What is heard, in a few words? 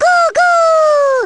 baby goo goo; baby mario